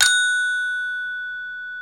BRONZE, Bronze Gamelan

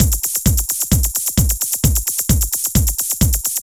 Drum Loops

